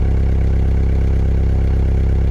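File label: VW T4 TD 1.9 engine idling perfect loop; 2000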